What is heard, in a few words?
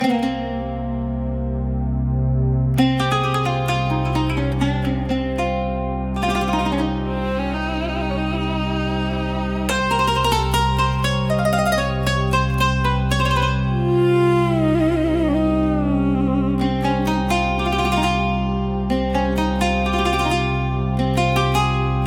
arabian ambience Background music; अरेबियन एम्बियेंस